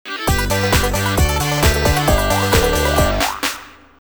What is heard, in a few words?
Celebration Banjo; Blastdozer; Nintendo 64